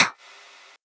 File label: Snares, Elektron MachineDrum SPS1 MKII